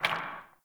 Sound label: Cave Rock; Ambience; Caves; Glades Of Andurin